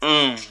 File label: mmm; ooh ahh